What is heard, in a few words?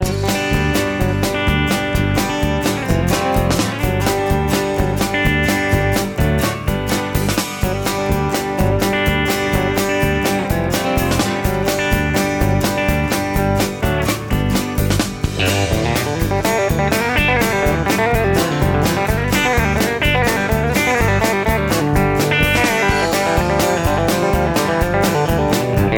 Full Picking